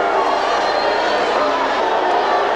Hockey Crowd Boo Loop; Crowds

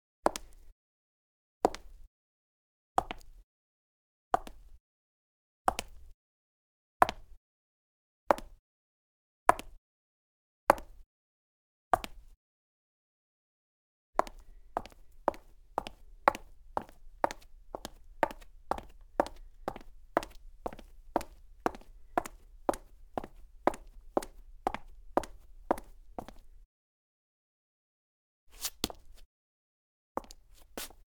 Cement Clean High Heels, Foley Footsteps; Sound Ideas

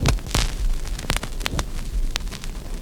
CRCK, Production Effects; XXL 800 Production Effects

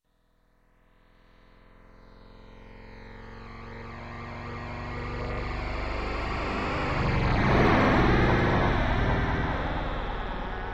Space Space Fizzle, Pan By, Electronic; Sound Ideas 2000 Series

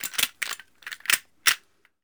Gun Handling Weapon, Weapons Foley; SFX Kit

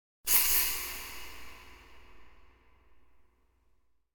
Impact Thin Pointed (double) Impact; Hollywood Edge; sound designer's toolkit v2

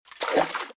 Footsteps Water Ankle Level; RadwinIsland

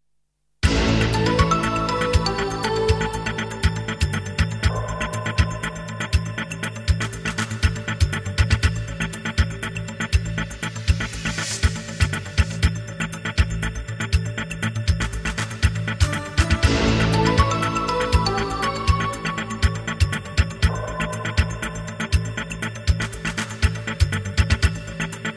Jingles; 90 DDD Jingles

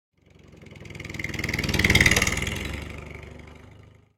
tractor; Blind Drive

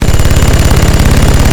Assault Rifle Shot Loop; Weapons

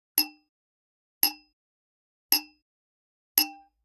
Generic Click Glass Small

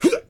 Hit

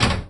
Pinball Bumper

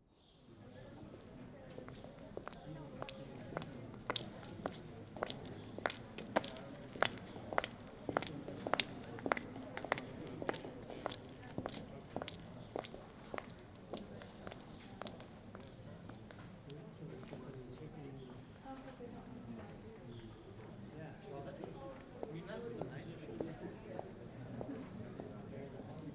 AMB Day; Blind Quest - The Frost Demon

